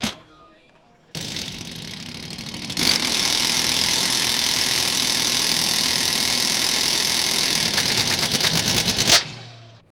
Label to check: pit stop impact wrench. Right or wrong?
Right